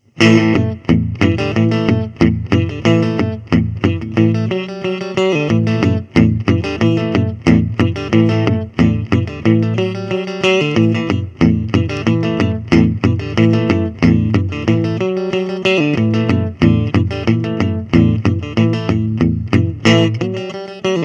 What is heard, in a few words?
el guit; Maestro; laydowns Clapton